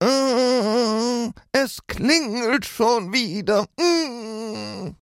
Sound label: hmmmm